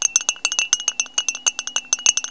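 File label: petuh rooster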